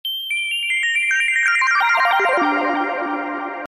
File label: magic notification